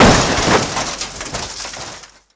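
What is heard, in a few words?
physics suburban crash impact distant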